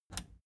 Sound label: plastic button scratch smooth release disable